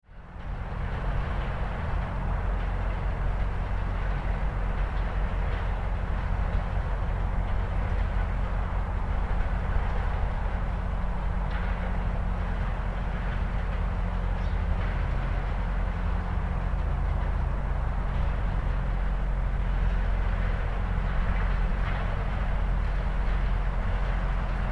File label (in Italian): campagnole, suoni ambientali; calliano; Alta Vallagarina; Portobeseno